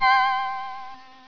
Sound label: doiing; alertViz